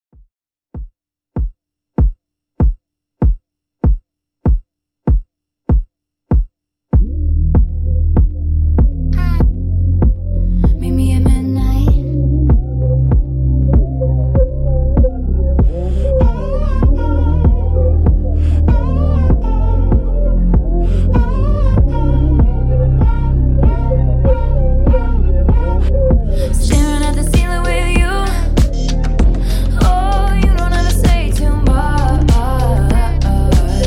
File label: top40 mixshow intro 97 bpm